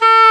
WND D OBOE, Oboe D'amore; Roland L-CDX-03 Disk 1; Oboe 4-8

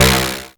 lead; Mono

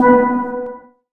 steel drum (B); Single Shots; Misc; Sonic Foundry (Sony Creative Software); Ugly Remnants VOL-2

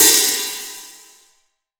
FLANGE 14 HH

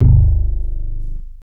BASS, POWER SYN; AKAI S6000 CD-ROM - Volume 1; SAMPLE WAVE MIXING 2

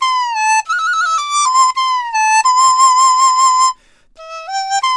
DIZU, 90; INIS - Opium; DIZU 100-C

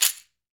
CAXIXI, Latin Shakers

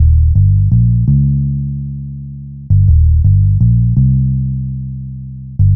Long Bass, Bass Lines 166.5; Jungle; Zero-G Jungle Warfare 1, 2 & 3; 90 s Sample CDs

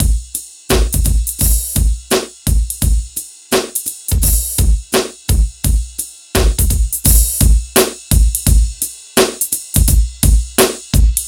DRM, Hip Hop and R&B Drumloops; Best Service ProSamples vol.01; AKAI

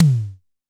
Tom Vel Low, Tama TS-206

